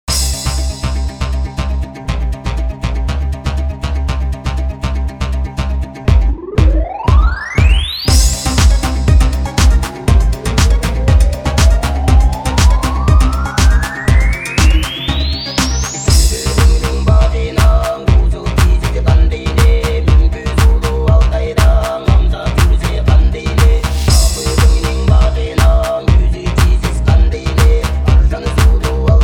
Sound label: Mongolian techno voice, Minimal Techno Remix